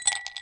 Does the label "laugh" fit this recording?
No